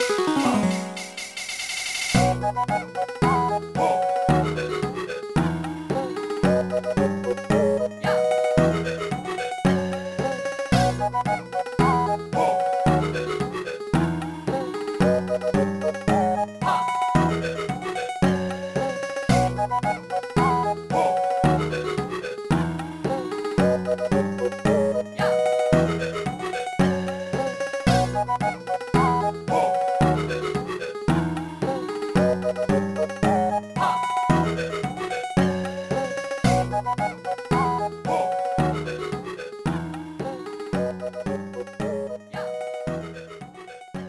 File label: Success Results; WarioWare D.I.Y.; Assembly Dojo